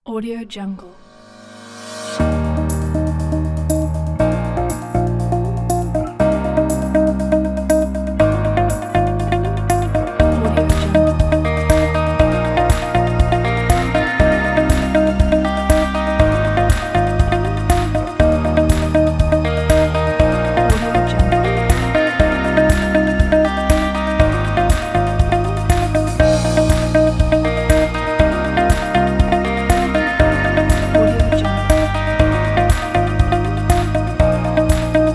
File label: uplifting inspiring and reflective corporate